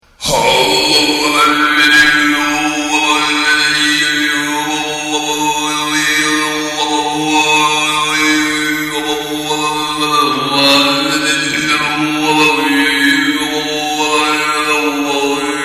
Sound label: horsey, khoomei